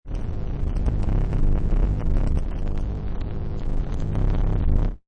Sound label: Glitch, Digital, Mechanical, Robotic, Computer Malfunction; Multimedia; Warped Sound Effects